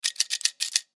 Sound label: Coin Dispenser; Coin Dispenser Dime Multiple; Money; Foley; Sound Effects:Objects; SoundEffects-Blastwave